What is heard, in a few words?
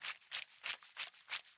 Mushroom Crawler Loop